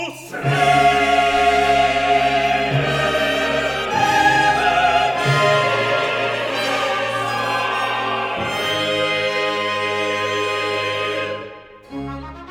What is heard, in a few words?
Coro; Cantata, BWV 34 'O ewiges Feuer, o Ursprung der Liebe'; 'Friede über Israel'; Bach; Long Melford; Whit Sun